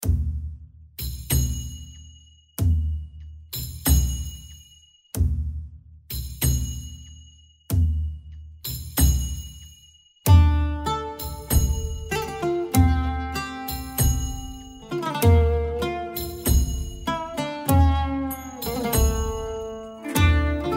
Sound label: Debka Instrumental